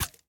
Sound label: Move Point; fairyred; red Riding Hood